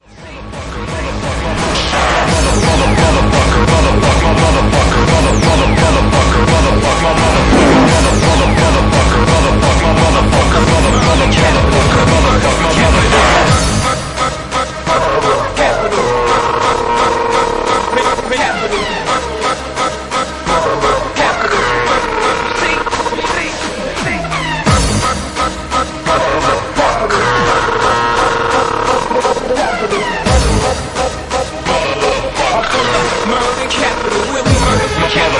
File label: happy hardcore remix